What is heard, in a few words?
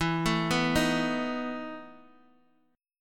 E Major 7th Suspended 4th E, Guitar, Open D; chord shape